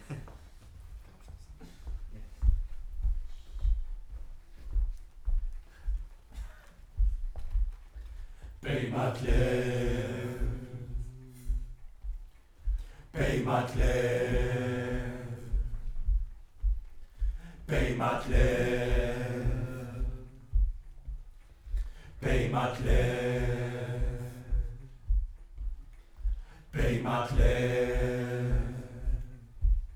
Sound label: Choir Far